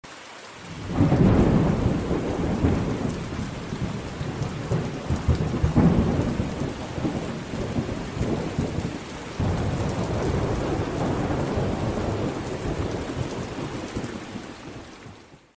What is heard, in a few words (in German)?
Gewitter